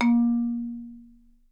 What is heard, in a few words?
A#2, Percussion & Harp; Miroslav Vitous - Percussion & Harp